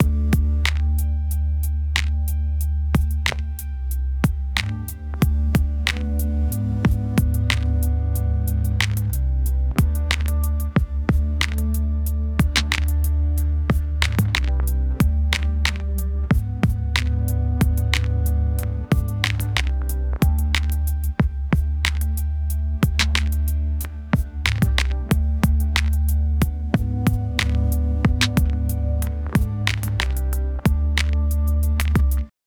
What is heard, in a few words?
loop 92 bpm, lofi